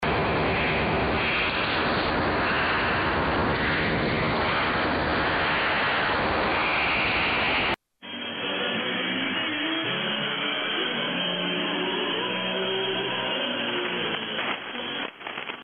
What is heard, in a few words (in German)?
Stoerung